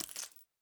Glass; Sonic Bat; Videogame Foley Essentials Vol. II

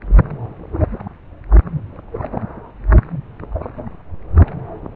pod amb; hl2

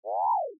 Droid Vocal

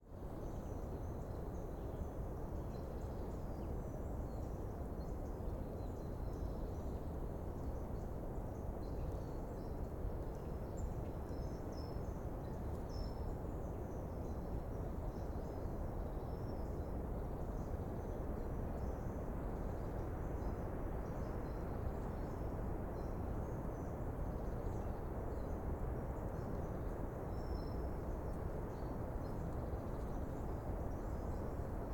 Wind dead leaves whoosh forest birds, Bora wind; European local wind

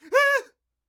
Scream Frightened Male; Bottle Rocket Fx; SCREAM LIBRARY

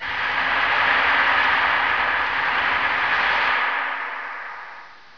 metallic; hl2

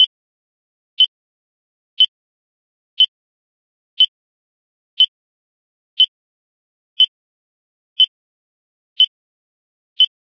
beep; hl2

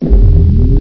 physgun loop, weapons; hl2

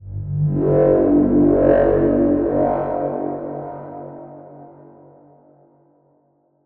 Atmospheric element Low; MatiasMacSD; INFILTRATION

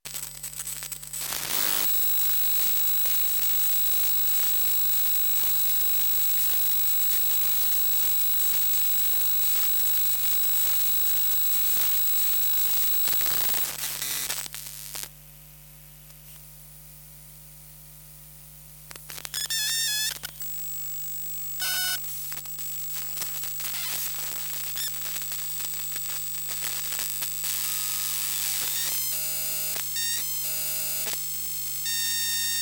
EMF Android Box, Electromagnetic Field; Glitchedtones

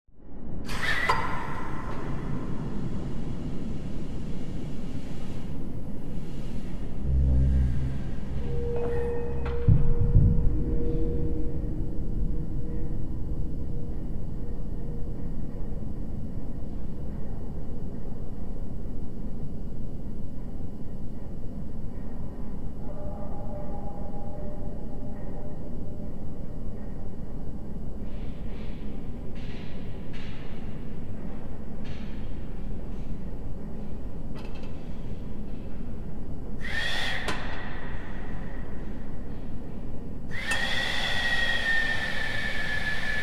Factory Hall with Large Steam Mashines, Steampunk; AMBDsgn; Justsoundeffects; Steampunk Gadgets

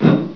ladder, player; hl2

